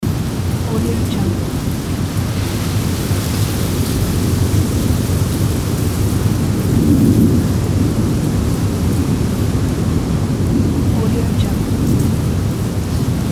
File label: storm wind blowing